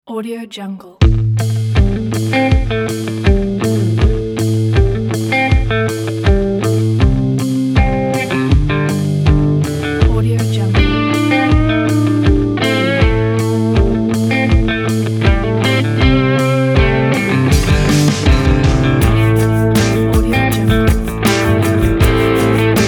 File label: country folk pack